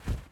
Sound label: Cloth Walk